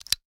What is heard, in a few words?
Gadget, Motors & Switches; 1001 Sound Effects